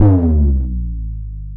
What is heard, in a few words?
BASS L, Drum & Bass 160 BPM; Ueberschall; Drum & Bass Flash Trackz